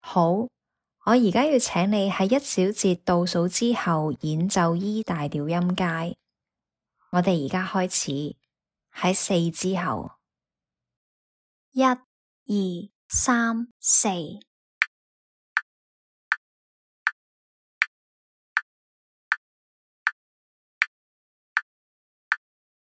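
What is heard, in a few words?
E major scale B major scale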